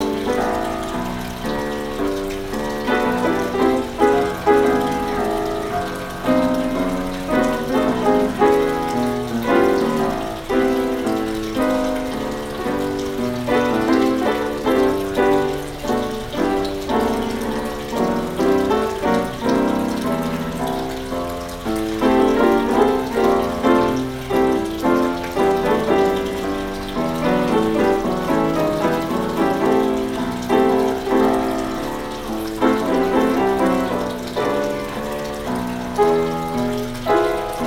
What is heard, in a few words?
hotel ambi piano fountain; best service Blue Box